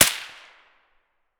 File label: sks fire silenced distant; Escape from Tarkov